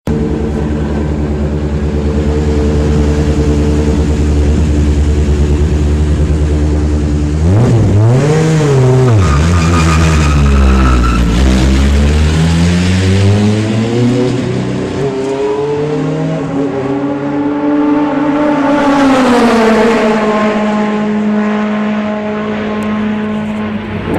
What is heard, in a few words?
Nissan Gt-r